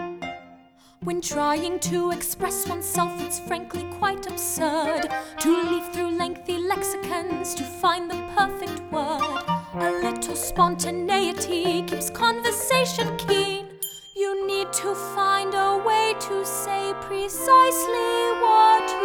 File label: with Vocals